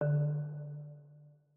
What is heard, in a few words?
Home Screen UI